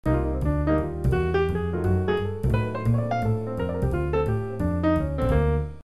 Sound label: piano